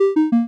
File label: fail, ui sfx